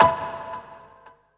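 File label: percussion FX, Dance Drums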